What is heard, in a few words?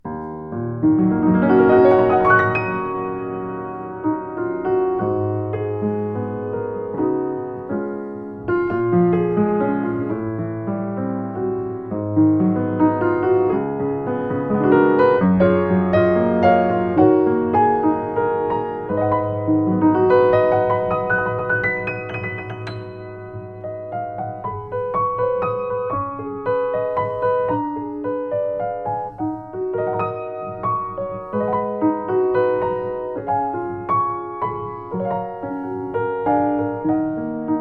Yamaha U1 M, piano